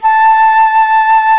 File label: A4 FLUTE; ORCH.; ORCH.COMP